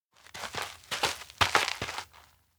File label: steps outdoor schoolyard walk; rikka; anemoi